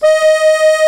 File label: AKORDEON D#3, ACCORDION